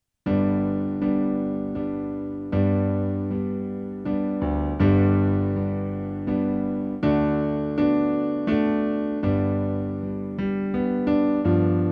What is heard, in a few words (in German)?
Piano G Dur